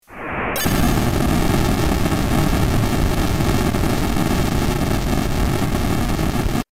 big inferno; ff3; ff3us

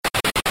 run away; ff3us

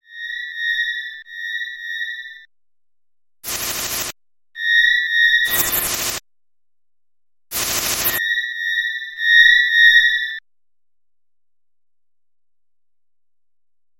crickets; final fantasy v

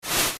thief; ff3us

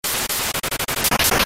ice; ff3; ff3us

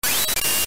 bolt; ff3; ff3us

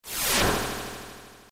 ice; ff3us

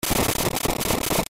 drill; ff3; ff3us

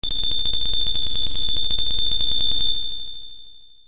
phoenix down; ff3us

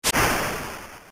water splash; ff3; ff3us

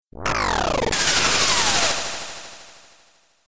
dragon attack; ff3; ff3us

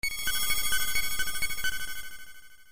haste; ff3us

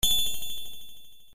ting; ff3us

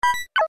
lagomorph; ff3us

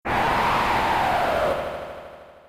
wind; narshe; ff3; ff3us

